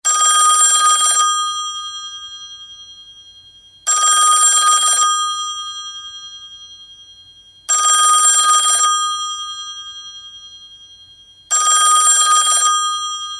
tring

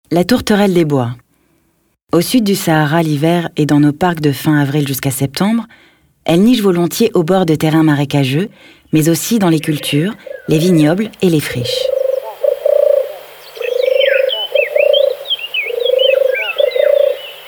tourterelle, oiseaux; sons oiseaux; carnaval

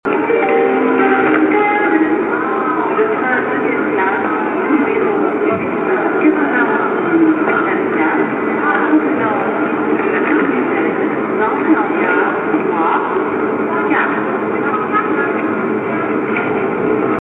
Jingle SNCF ambiance gare

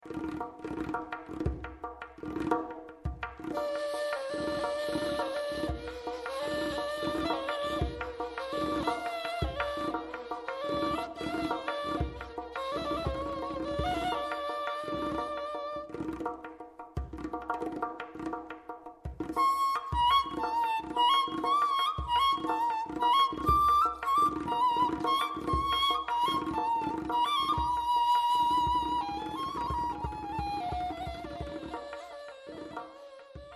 flute ney percu; afrique; nordouest

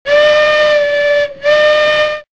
Train klaxon

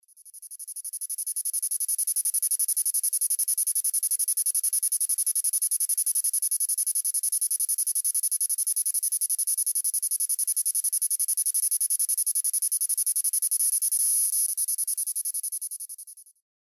cigale; carnaval

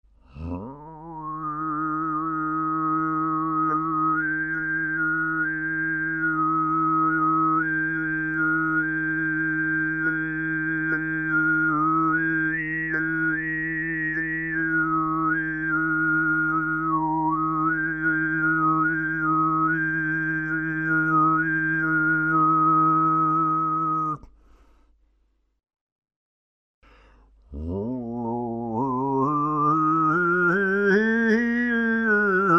diphonie, voix ethniques

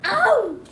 Aouh

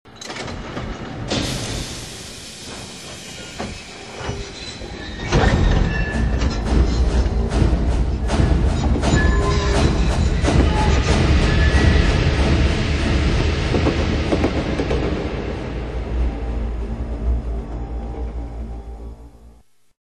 Train fermeture des portes

